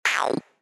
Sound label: Squelch; LD42 SARD inc new ship